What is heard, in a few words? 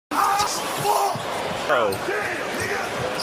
scream; paolo banchero